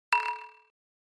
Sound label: Pop Up HF Elect Tap Rev, pop up element